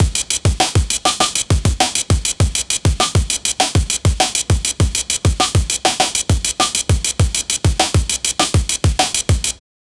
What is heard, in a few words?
breakbeat drum fill